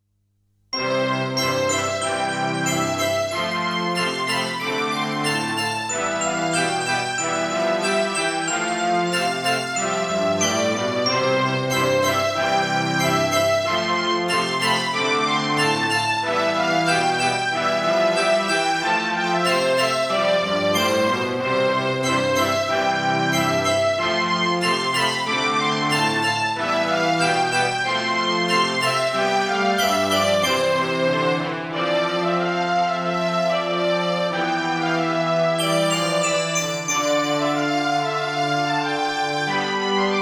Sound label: school music